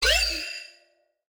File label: Phase Plant, bass